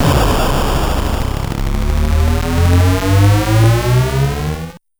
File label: head kill sound